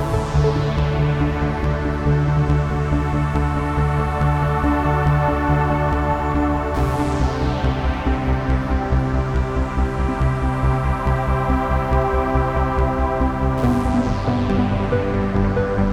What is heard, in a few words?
Planetarium (Looping)